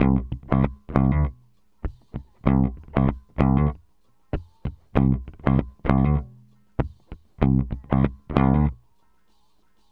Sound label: Bass, RnB